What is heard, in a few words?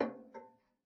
Ping, Kitchen Toaster